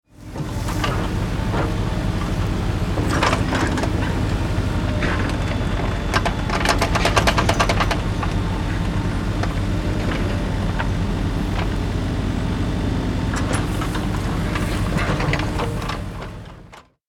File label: Excavator construction vehicle digging